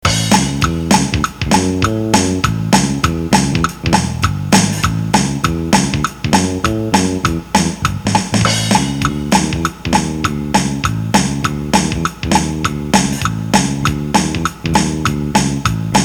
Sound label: bass midi